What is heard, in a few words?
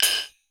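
GATE percussion; Crypton - DrumBase5000; GATE KITS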